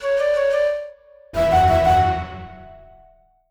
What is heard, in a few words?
item get; ashes of the night